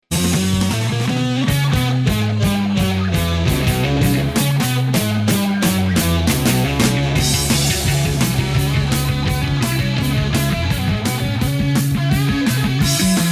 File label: solo